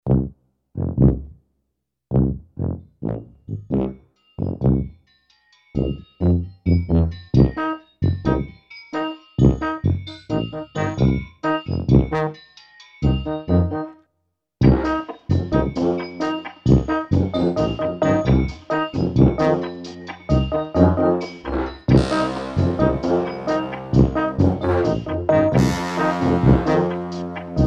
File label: low banda e brass